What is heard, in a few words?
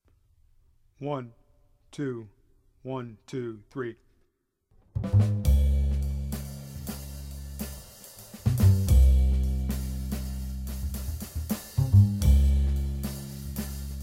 jazz piano play along track